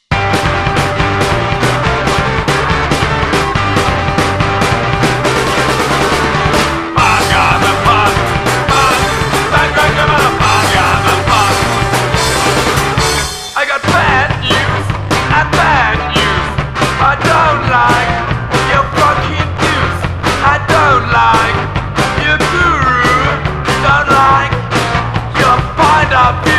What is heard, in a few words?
punk rock drummer